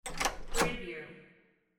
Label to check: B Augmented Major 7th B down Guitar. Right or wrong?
Wrong